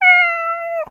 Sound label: meow